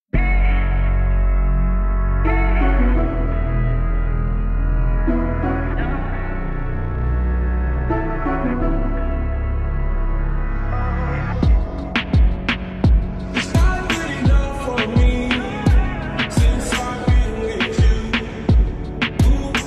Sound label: slowed reverb